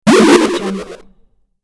upgrade retro gameplay

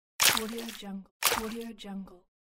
splat

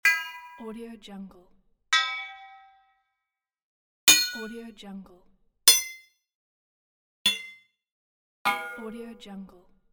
metal pipe

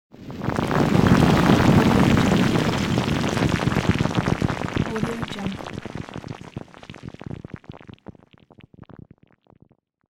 rocks fall

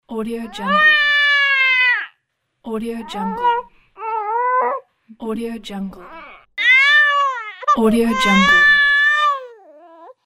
baby crying

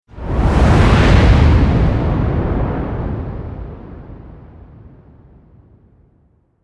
transition cinematic scifi

